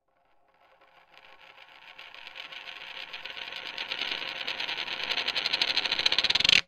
household hair gel pack lid down on surface spin to stop